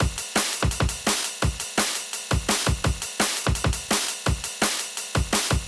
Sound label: Drum loop